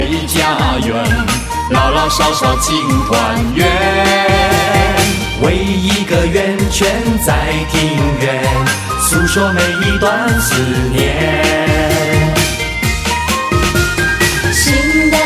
Jazz

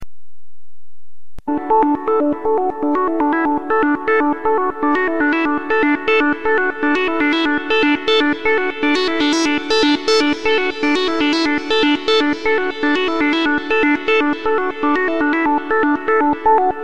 Wake Up Alert; Sony

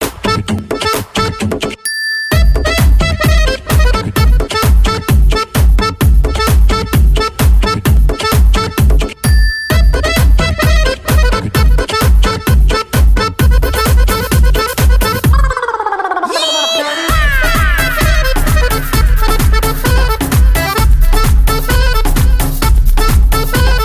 cool ringtone; reggae

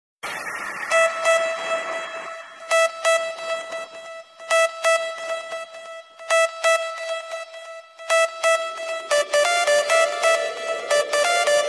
magic tone, ringtones electronic

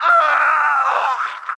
Marine Dying

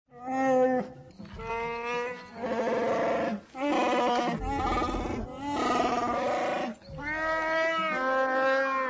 Noise

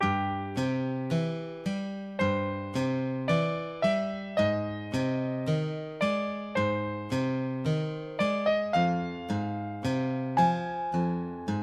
sweet guitar love

